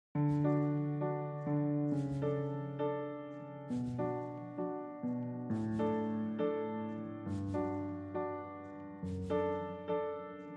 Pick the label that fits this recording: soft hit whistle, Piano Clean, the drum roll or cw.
Piano Clean